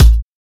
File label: kick, drum hits; Anthemic